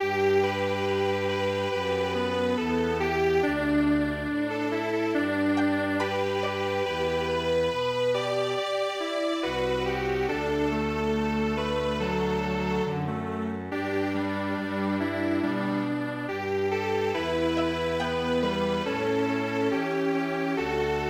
winter night strings

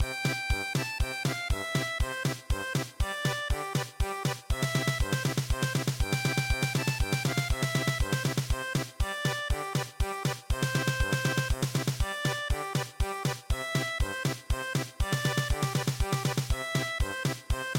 German Polka, midi; Ach Du Lieber Augustin

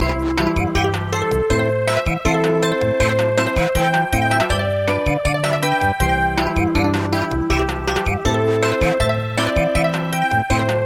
Samba, midi traditional; Window fly

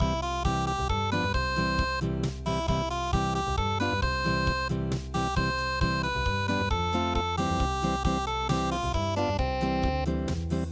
unpluged

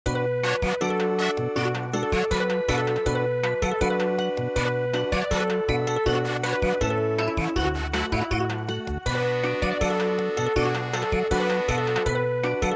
Samba

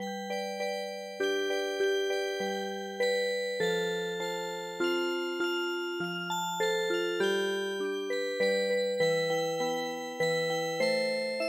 Music Box, traditional

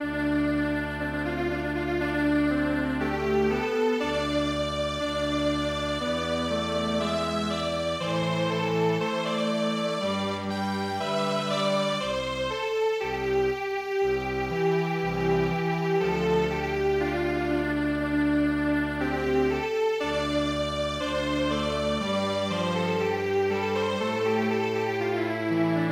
Strings Quartet, midi traditional

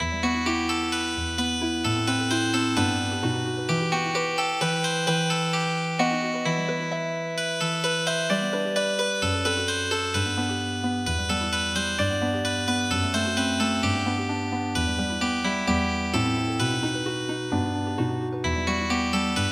Healing Harp, traditional

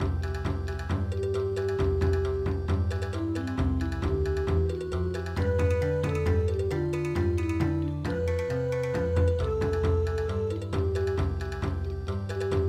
Bon Odori, midi; Hail To Britannia

